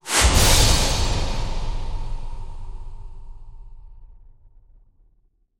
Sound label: Music Stingers Stinger Death; frontal channels; Hunted: The Demon's Forge; x360